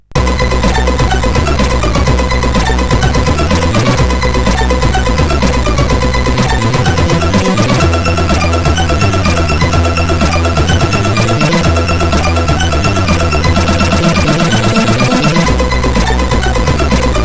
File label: Light Intro sid CSG8580R5